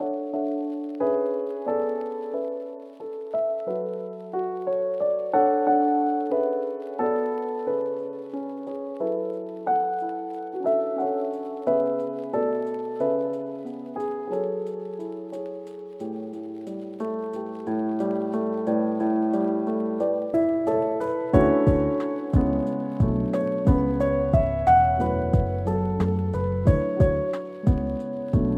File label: Lofi Piano Instrumental